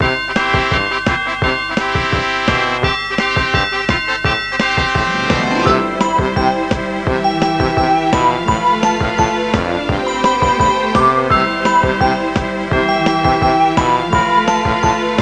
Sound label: menu; 磁石の塔デモ